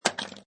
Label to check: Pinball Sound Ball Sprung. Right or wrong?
Right